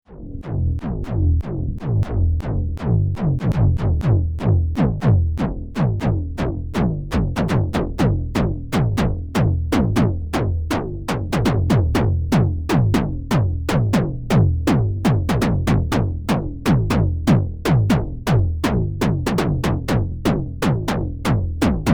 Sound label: Bass; LAMM RawArtistic PhilKullmann